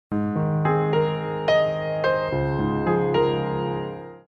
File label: text tone